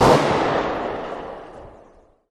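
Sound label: m4a1 unsilenced distant, gun; arccw go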